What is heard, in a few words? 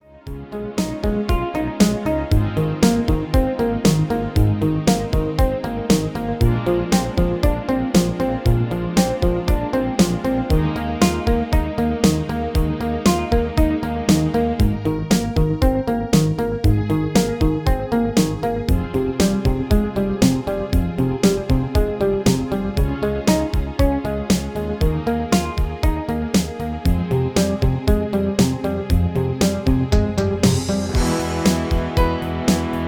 MIDI